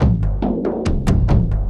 Chunka Wunka Dooga Funka, Drums, Frame, Kendang, Short; Sonic Foundry (Sony Creative Software); Friendly Fire